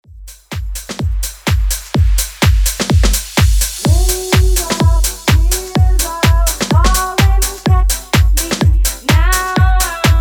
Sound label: 2ks House Redrum Clean